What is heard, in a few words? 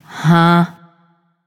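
confused, female; smite; voicepack; hera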